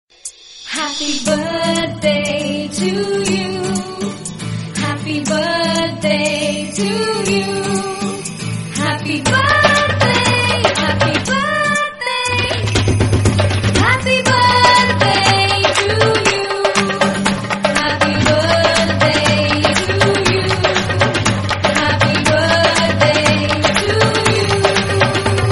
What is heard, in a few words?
happy birthday ringtone